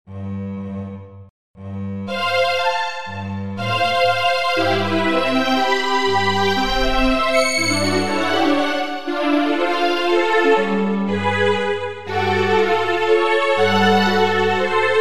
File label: quartet